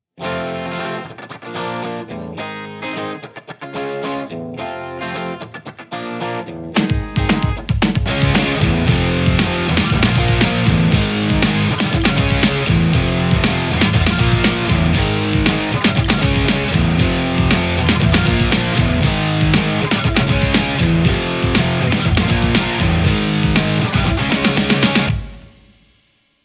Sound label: Les Paul; poulin hybrit